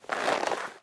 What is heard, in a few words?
item leather pickup